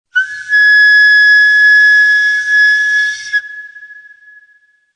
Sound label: Tiz Mahur, Ney